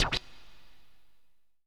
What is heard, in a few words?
hit